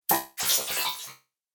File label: Droid Wet, robot